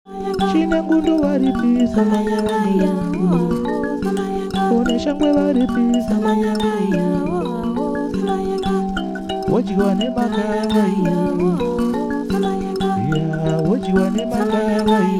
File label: mbira